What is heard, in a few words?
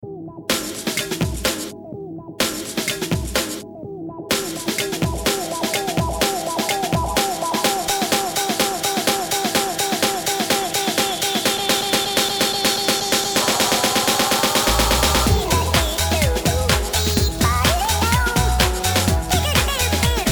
Remix; Liquidize; Hamster